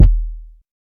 subtle reverb kick D# key, Royality free steel kick drum tuned to the D# note